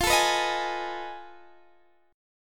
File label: F 7th Sharp 9th Flat 5th, Guitar, Open D